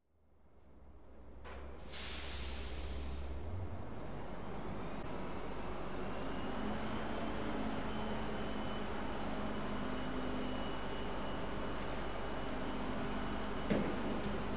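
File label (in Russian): грузовик выгружает камень в дробильный аппарат процесс дробления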